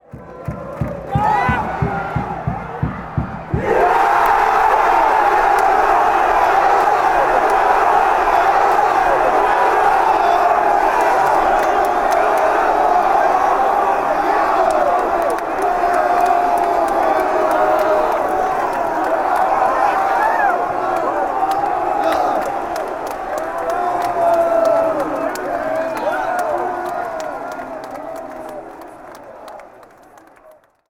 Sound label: tor, fan chants; rapid